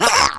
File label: gurp; imp